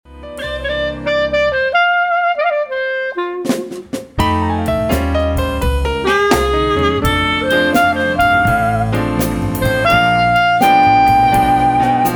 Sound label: fade